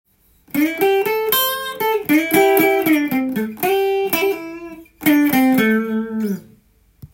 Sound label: blues short solo; guitar; blues short phrases tab